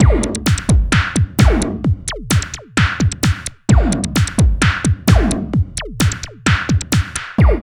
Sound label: LOOP, Tekno Industrial; East West